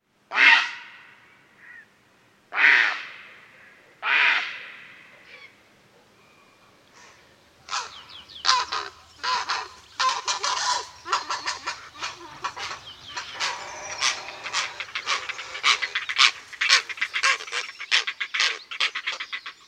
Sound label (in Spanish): Garza real